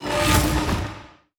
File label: transition; amazon city of gold; Popiplay; Andrey Sharshov